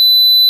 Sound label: fire alarm annunciator piezo